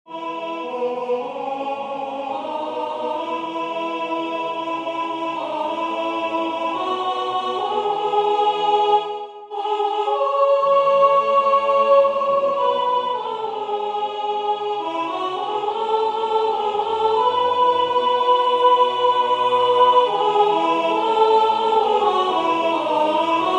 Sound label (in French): Nous venons nous consacrer soprane